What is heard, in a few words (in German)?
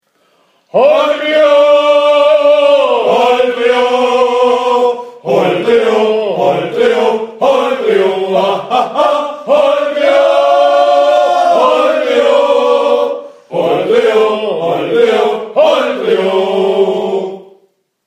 Holdrio